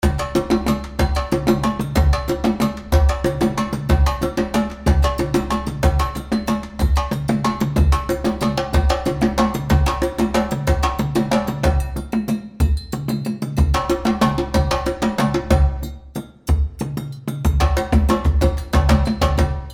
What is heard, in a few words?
West Africa Ensemble Diabara